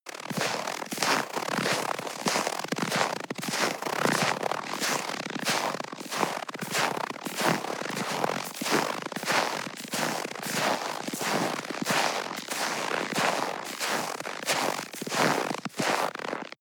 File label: step snow walk